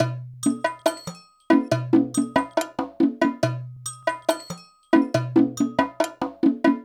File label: Hand Drums; Sonic Foundry; Cinematic Funk Urban Tone Poems; Master Zorn